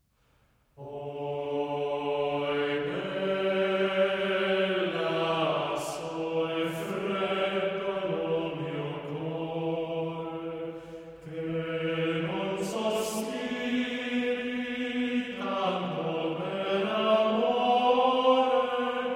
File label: Vocal Ensemble; Laudario di Cortona; Excerpts Arr. P. Hillier